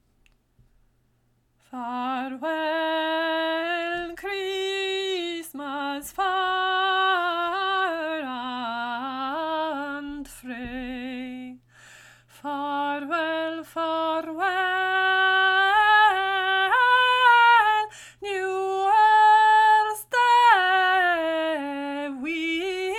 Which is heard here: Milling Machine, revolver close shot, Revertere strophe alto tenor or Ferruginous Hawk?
Revertere strophe alto tenor